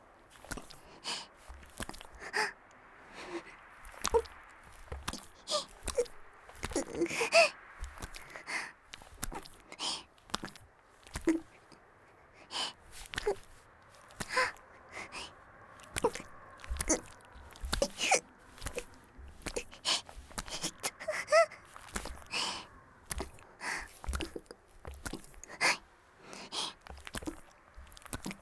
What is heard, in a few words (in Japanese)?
ギシアンループ, 木枯らしバージョン; あ～にき！いくら出す?